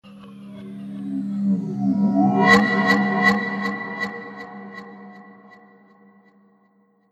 Alien Aura; Alien Sound Effects

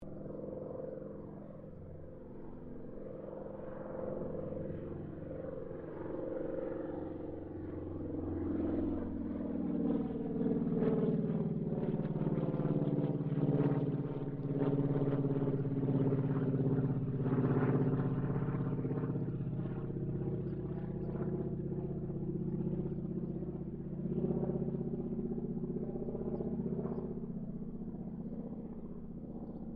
AIRCRAFT AT-6D HARVARD MK III PASS DISTANT; Temora Harvard MK III; Airplanes; Vehicles

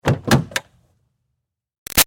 Car Highlander Door Open Hard; 2005 Toyota Highlander V6; Cars; Vehicles

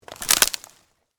Tree Branch Break; Wood; Sound Effects:Materials

